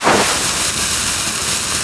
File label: Kobold fuse light; Enemies; Dungeon Defender; DunDef